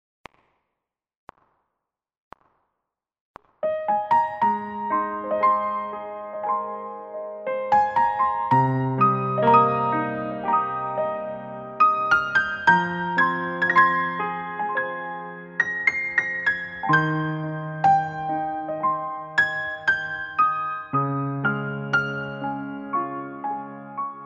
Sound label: piano play